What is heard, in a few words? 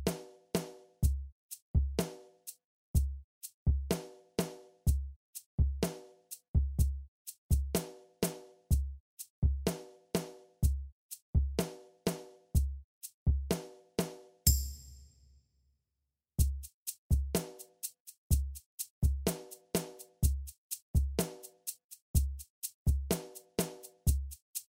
drums; SiSEC11